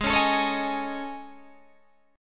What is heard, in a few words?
A Major Sharp 11th, G sharp